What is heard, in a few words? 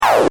Minimise, Effect To Accompany Minimizing Of Window Or Program; Transitions; Multimedia